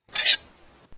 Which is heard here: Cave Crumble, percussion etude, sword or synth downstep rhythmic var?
sword